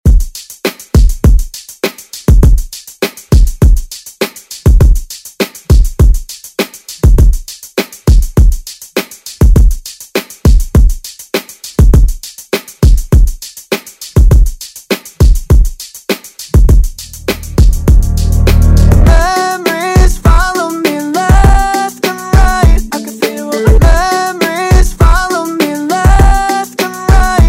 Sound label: Pop Redrum